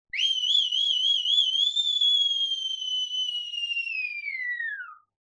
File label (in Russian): svistit razboinik